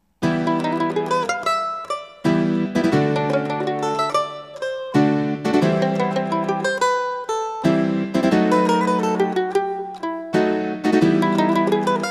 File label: rumba